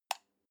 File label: light switch off soft